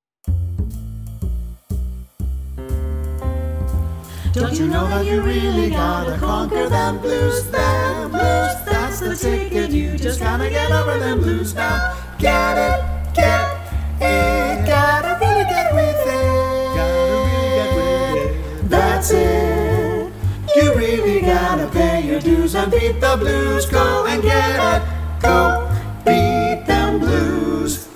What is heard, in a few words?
Vocal Blues Etude NO ALTO